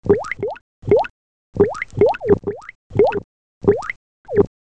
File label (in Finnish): Kupla loop